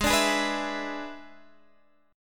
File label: E 7th Ab, guitar